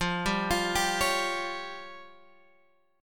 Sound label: F Suspended 2nd Sharp 5th F down Guitar Open G